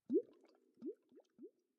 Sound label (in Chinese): 水下气泡声; ambient